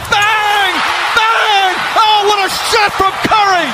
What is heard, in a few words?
bang